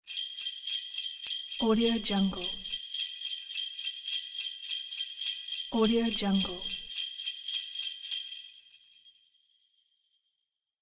sleigh bells

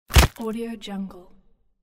boxing punch leg break bone

